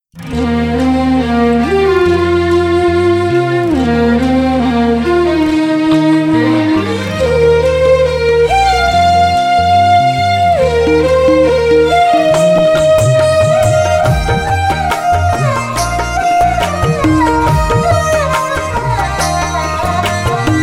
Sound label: vocal